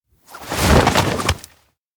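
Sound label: catch frisbee fly; anemoi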